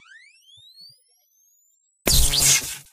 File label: manual defib; Barotrauma; Neurotrauma